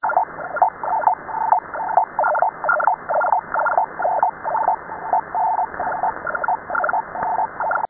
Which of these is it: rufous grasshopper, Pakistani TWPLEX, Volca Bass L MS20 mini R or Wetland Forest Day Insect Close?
Pakistani TWPLEX